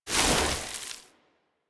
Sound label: Hoverboard Terrain Caster Snow; Warframe; DE; Vehicles; Venus